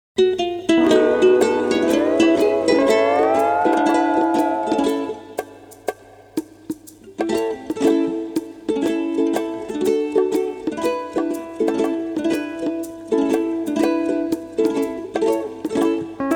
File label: ukulele karaoke